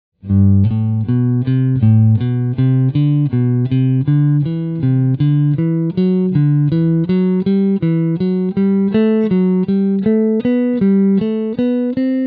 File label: Major Scale 4 Step, guitar